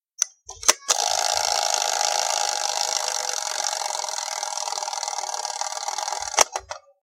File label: timer